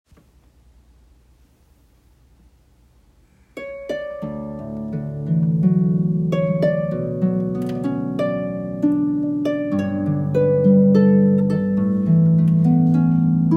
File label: harp